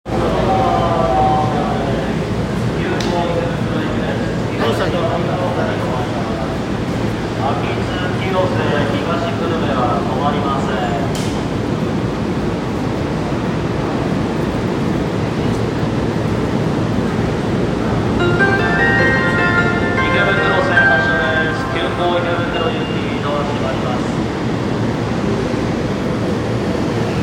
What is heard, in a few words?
train sounds; 西武池袋線所沢東